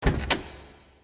Nokia Car open

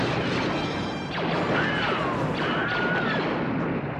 Wilhelm Scream

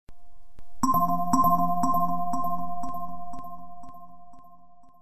3d Msg

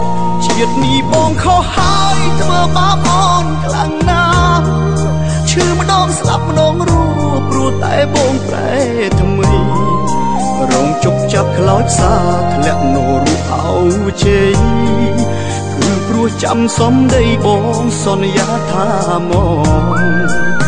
Khmer Song

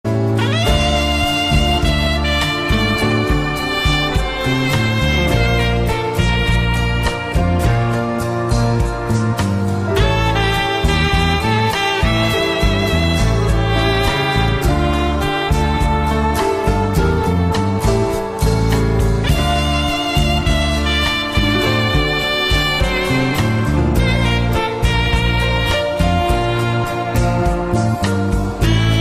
Detective Conan Sad

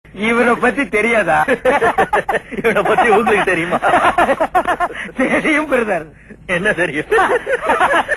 Laughing

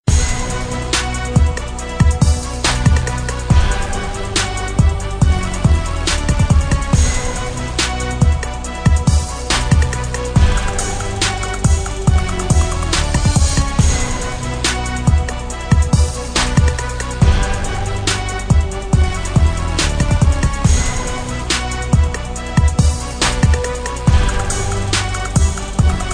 Hip Hop Beat

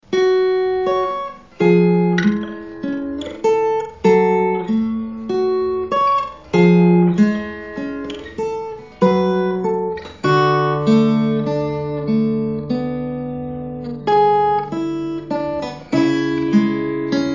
Acoustic

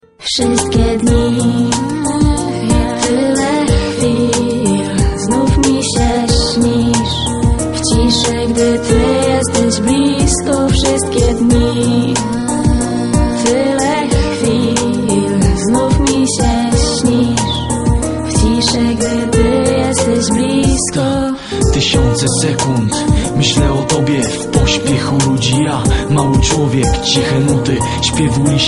Hip Hop

